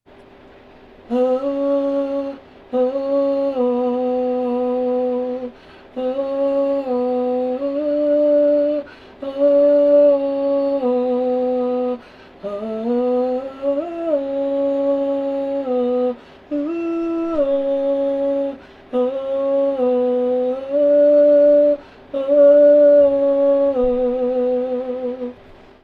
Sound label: Vocal Recording Sample PHONE